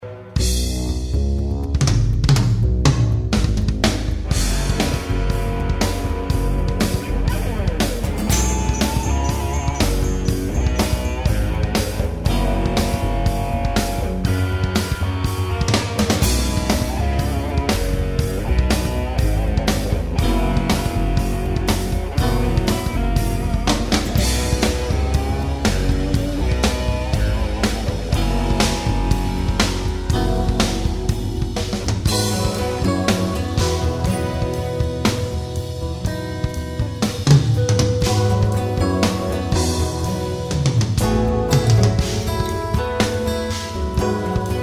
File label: Instrumental Rock